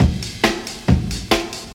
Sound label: 139 bpm breakbeat d sharp key